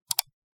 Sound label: click sound